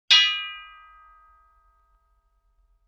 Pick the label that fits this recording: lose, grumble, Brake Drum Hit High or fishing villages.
Brake Drum Hit High